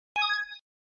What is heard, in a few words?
correct sound